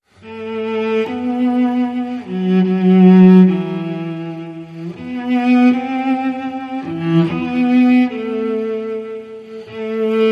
Cello

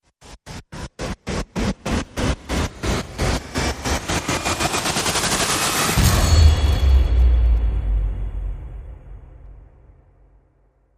Rise Short Look Out Kid

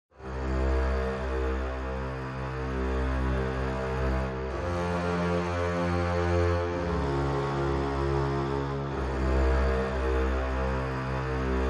Legato

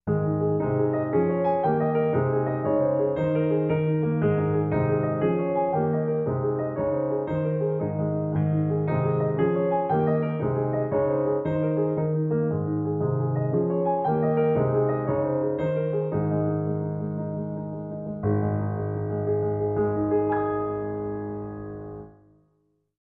Solo Piano

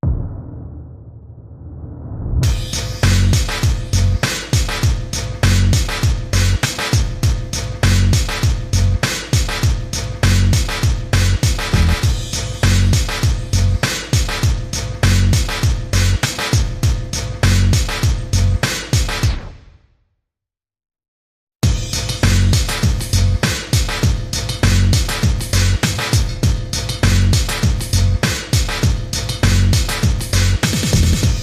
Drums and Bass Mix

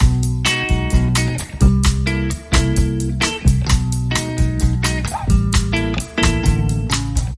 Street Bossa

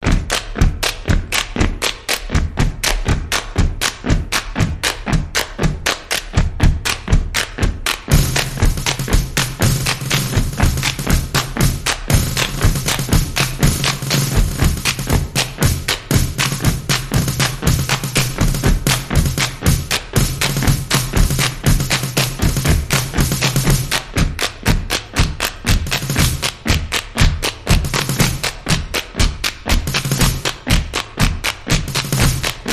Military Stomp

